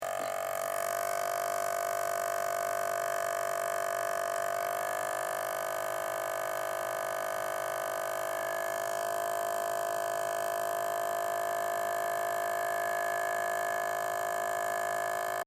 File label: macbook noise high